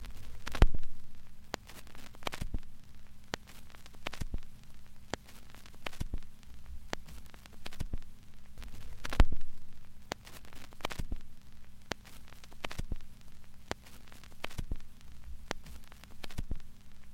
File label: Record Static